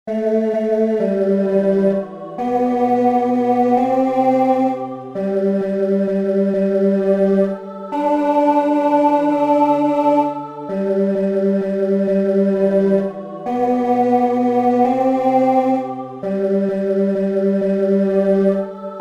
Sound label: Chorus 3 + Inst Tune Alto